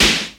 airy sizzle punk rock steel snare drum g sharp key; 80s